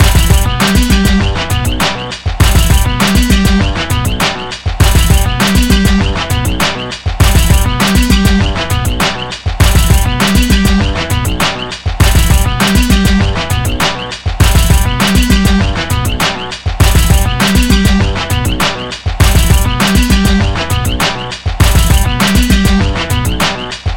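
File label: Synth Hip Hop Ringtone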